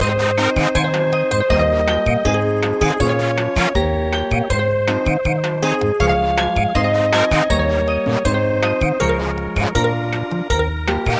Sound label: Samba, midi